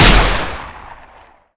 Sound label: laser rcw fire; weapons